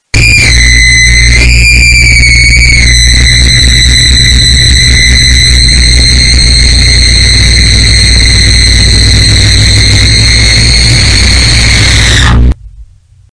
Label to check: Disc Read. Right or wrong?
Wrong